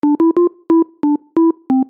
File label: Sinus